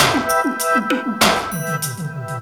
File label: FILL DRUM; USB Soundscan vol.27; FILLACOUS